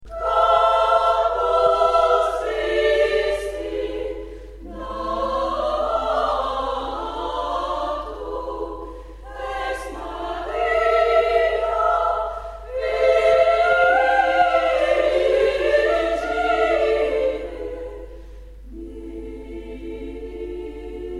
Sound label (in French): ave verum choeur de femme